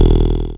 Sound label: Synth Bass; Vengeance Samples; VEE Synth Shots